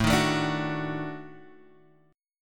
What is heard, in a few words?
D sharp Minor Major 7th Flat 5th, Guitar